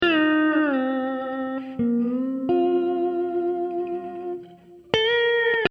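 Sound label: guitar slide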